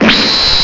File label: mind thrust